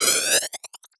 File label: Multimedia Internet CD-Rom Flash Wipe Electronic